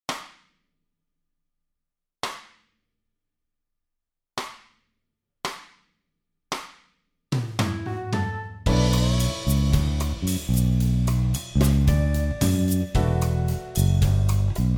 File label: Latin Slow Base Basso